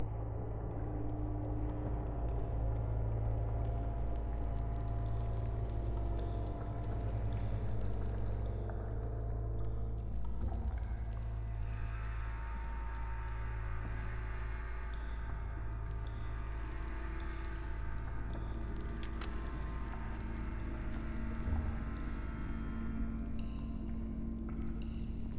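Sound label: ambience Ice Cave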